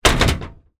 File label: household step ladder lean against garage wall